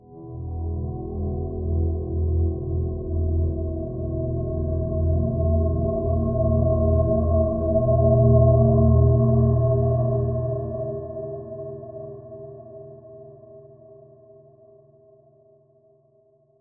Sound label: suspense; DayZ-Epoch; dayz sfx